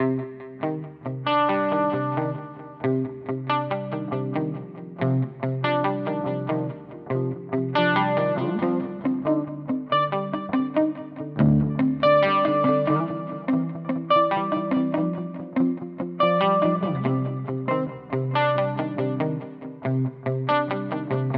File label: Harley Benton DNAfx GiT Ping Pong Delay